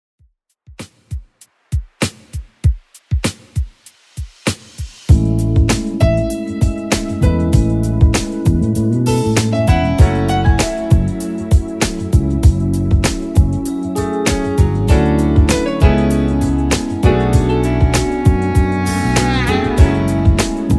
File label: 90s, 98 bpm; Redrum